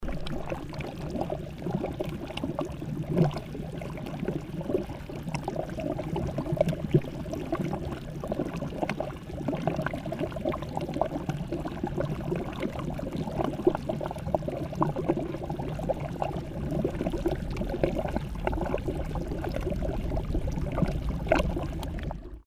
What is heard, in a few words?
volcanic mud pool